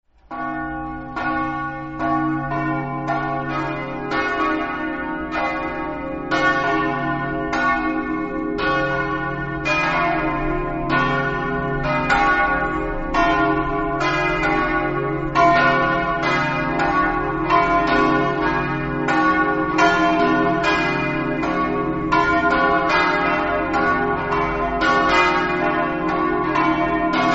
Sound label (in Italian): campane; vmarsala